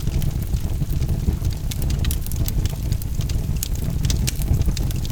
Magic Harvest; The Princess and the Frog; wii windows; Hub Swamp; Cursor Potion; Magic Harvest Cauldron Fire

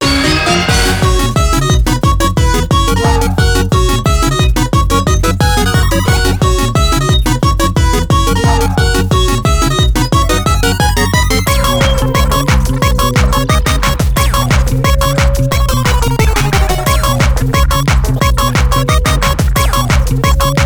BGM Gameplay Semi

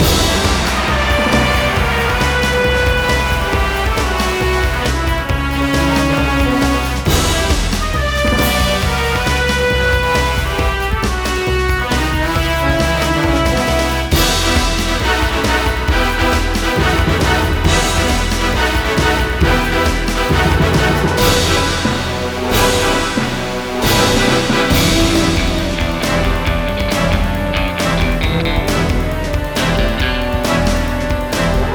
Snipe Loop; 007 Nightfire; Xbox

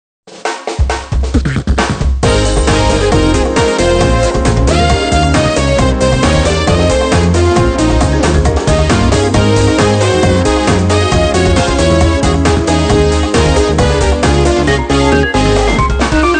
Music Success event

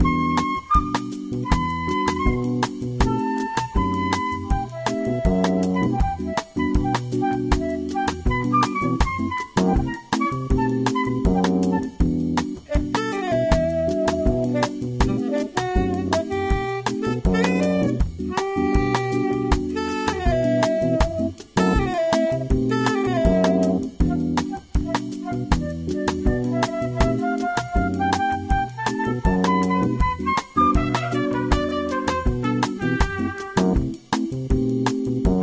elevator music; Pocket Mortys

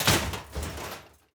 metal break

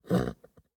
horse grunt; teardown ps5 windows xbox series xs gamerip 2022

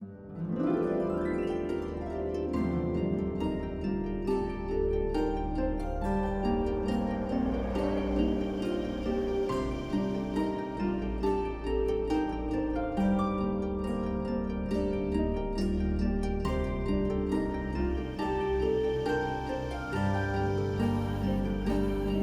Instrumental

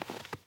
snow step

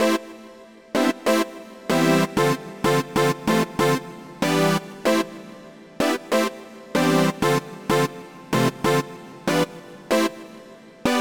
Polystacks; super crush k.o.

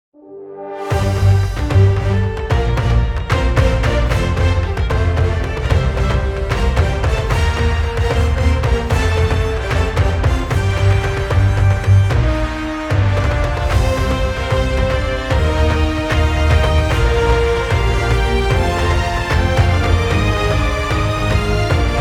Menu Pirate Victory; Blackwake

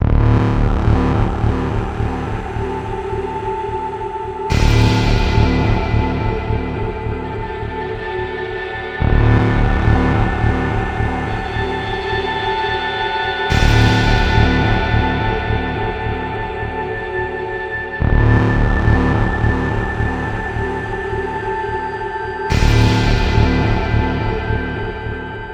Industrial District ~ Pre-Race; Full Auto 2: Battlelines

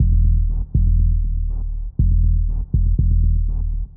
Car Passing

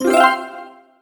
Picture Reveal; the princess and the frog; Orleans; Draw Wallpaint

